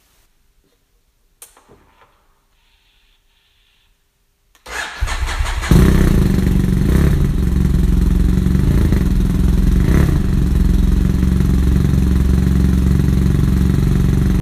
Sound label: F800GS Racing Sound